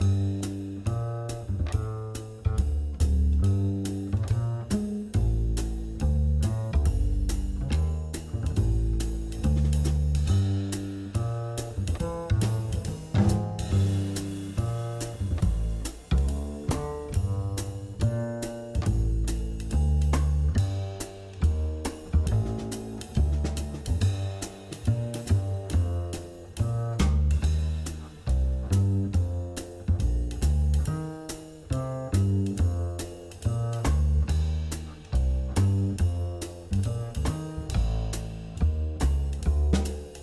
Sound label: swinging turn bass drums